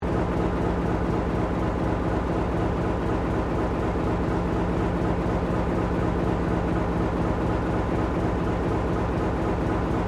Train Diesel X Class 1951 Tasmanian Idle Engine Loop; TGR; Bellarine Tourist railway vehicles; Trains